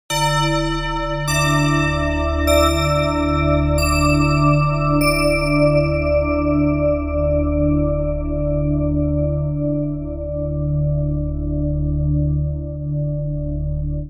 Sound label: Noise Glockenspiel, struck instrument simulator